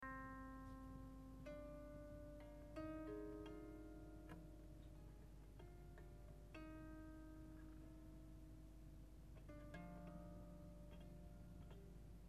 pose, harpe

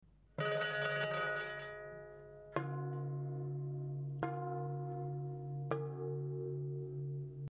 pince, harpe

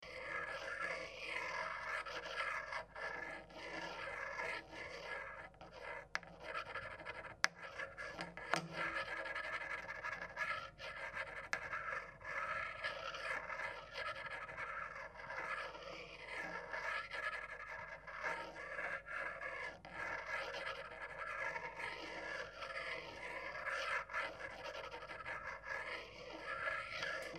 tige craie

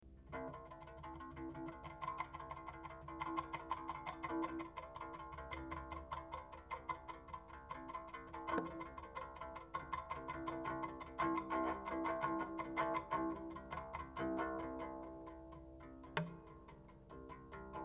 archet rebond, harpe; touchers de la harpe

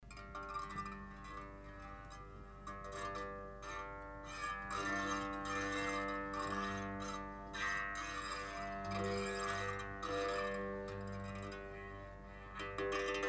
crin, harpe

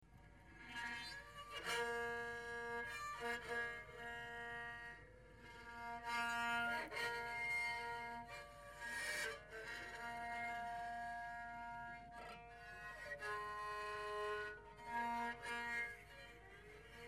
archet, harpe; touchers de la harpe

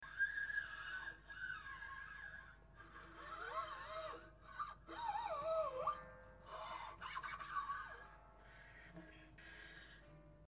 chiffon, harpe

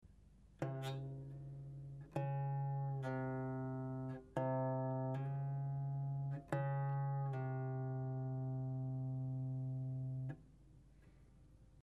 levier, harpe

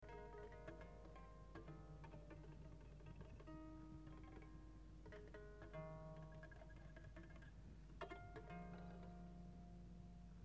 tap, harpe

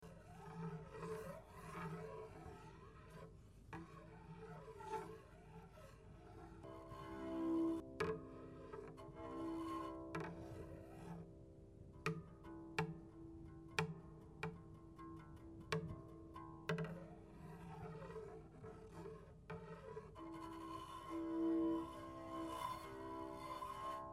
archet mix, harpe; touchers de la harpe

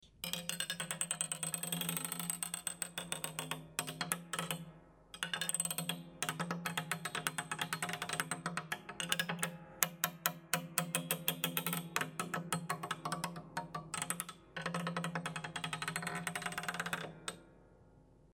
tige roulement, touchers de la harpe

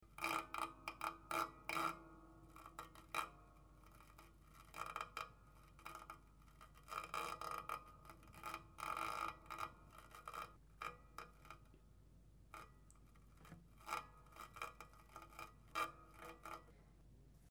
archet grince, harpe; touchers de la harpe

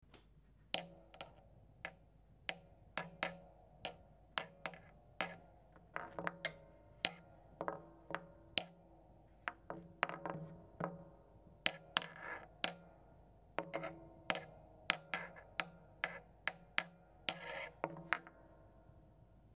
tige, harpe

